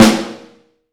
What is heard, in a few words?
Snare Drum; Q Up Arts; Johnny.C's Vintage Snare Drum; 6.5X14